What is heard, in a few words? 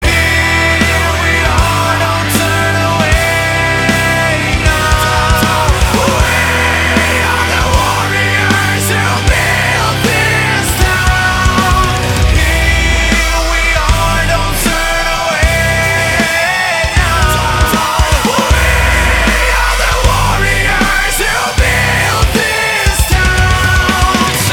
metal cover